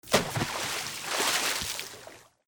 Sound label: WATER